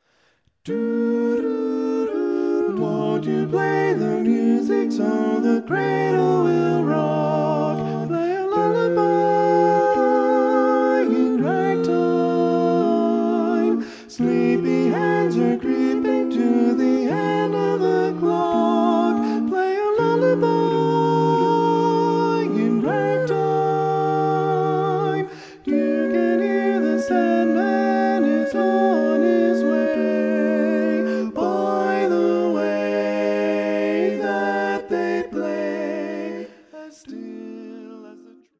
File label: Acappella Choral Barbershop